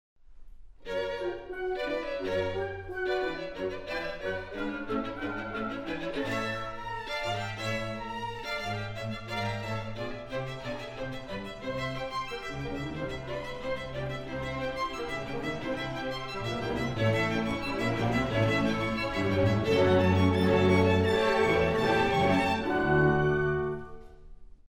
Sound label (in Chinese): xiaotiqin; 斯特拉迪瓦里琴与新琴音质差距多大听听分辨一下